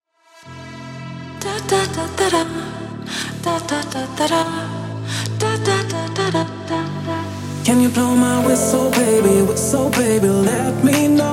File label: whistle